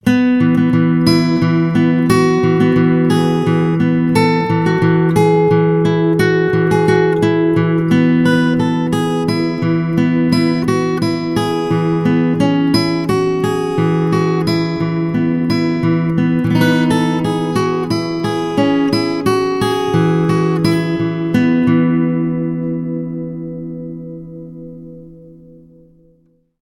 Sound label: guitar